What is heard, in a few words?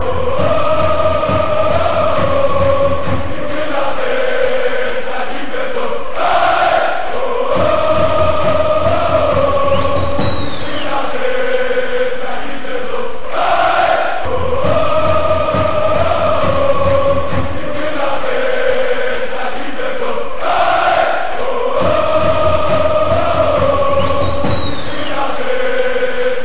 ooo ooo stadium; at nea philadelfeia aek